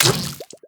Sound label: Wet Splat